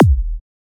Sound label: Kick